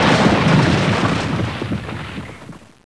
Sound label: explode; quake; Caesar III